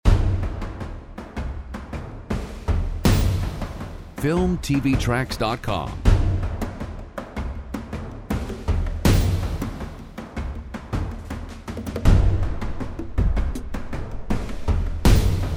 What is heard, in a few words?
Hostile Percussion